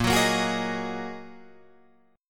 Bb 7th Suspended 4th Sharp 5th Bb Guitar Open F; Bb7sus4#5; strummed; 10,13,10,13,11,10; JGuitar

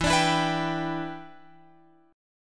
E Minor 7th, down, Guitar, Open F; Em7; strummed

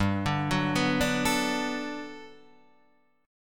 G Major 9th, Guitar, Standard; arpeggiated

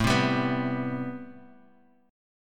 A-Minor 9th A Bass Standard; strummed; JGuitar

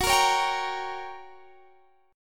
G Minor Major 7th Flat 5th, F sharp

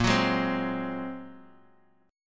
Bb 7th Suspended 2nd Sharp 5th Bb, Bass Standard; Bb7sus2#5; strummed; 18,21,18,17; JGuitar

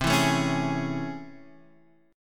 A Major 7th C; strummed; JGuitar

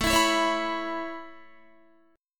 A Major Add 9th A Guitar, chord; Aadd9 strummed

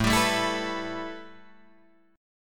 A 7th Suspended 2nd Guitar Drop D

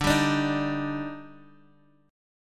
D sharp Major 7th D

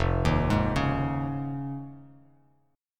F Minor 7th Sharp 5th, Bass Standard; Fm7#5 arpeggiated; 1,6,6,6; JGuitar

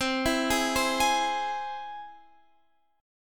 C Augmented down Guitar Half Step Down

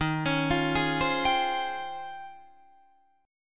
D sharp 13th, Guitar, Drop D

